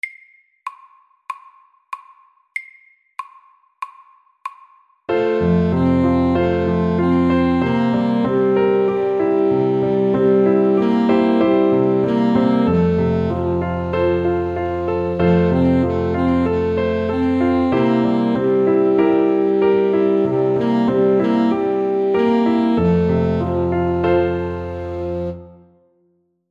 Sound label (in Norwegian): Walesisk melodi MEDIUM 95